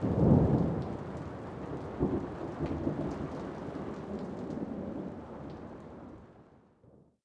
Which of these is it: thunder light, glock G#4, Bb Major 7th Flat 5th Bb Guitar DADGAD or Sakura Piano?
thunder light